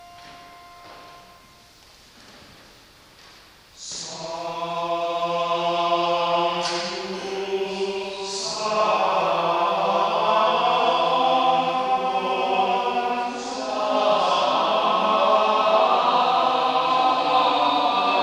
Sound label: sanctus missa super dixit maria; hassler